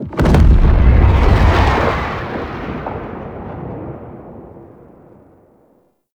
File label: distant cannon fire; Makvoss; Warframe; Tau